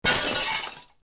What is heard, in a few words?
Glass Break Pane; Breaking; Glass Pane Breaks; Objects